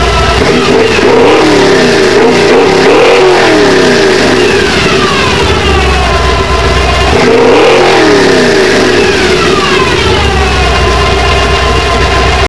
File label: 350 revs